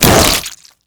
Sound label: gore splat